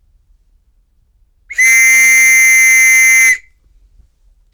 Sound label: Postmens Whistle